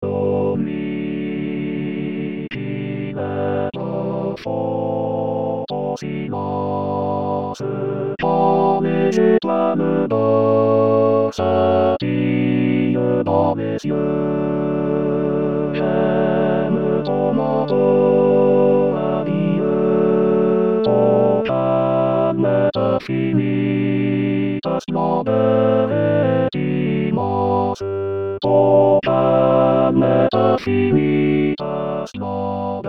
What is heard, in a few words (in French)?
Tutti; Hymne a la nuit